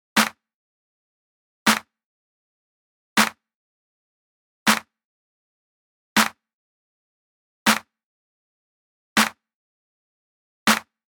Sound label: clap